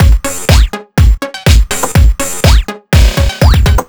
123 bpm full, combi loops, nu dance electro; Zero-G; Soundclash; Acidized Wavs; Pet Shop Toys

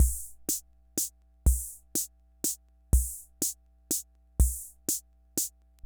Ace Tone Rhythm Fever Loop

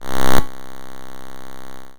C3, Synth Hits; Zero-G; Soundclash Nu Dance Electro; Acidized

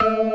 CLASSIC C3, Synth and Organ; InVision Interactive; Keith Emerson; The Most Dangerous Synth and Organ

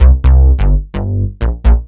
BAS, 2 Step; USB Soundscan vol.51; House Side Of 2 Step; AKAI

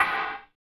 factory; Drums In Your FACE!

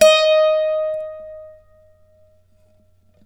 d4, Mandolin; Terkelsen

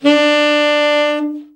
TEN SFT, SAX; Best Service Gigapack 1; BR SAX 3

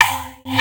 Rimmer, Drum&Bass Hits & Bits; Total Drum&Bass; 90 s Sample CDs

